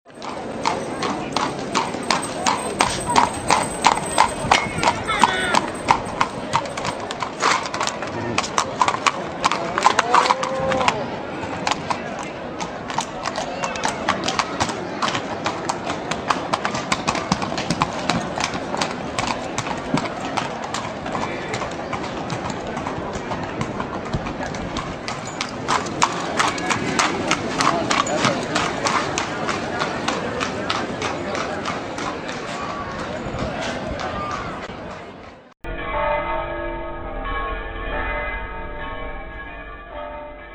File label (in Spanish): coches de caballo